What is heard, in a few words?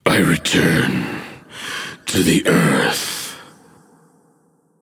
death; cernunnos